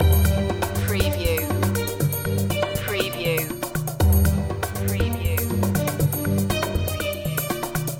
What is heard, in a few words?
ethno breaks loop